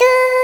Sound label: TONE C3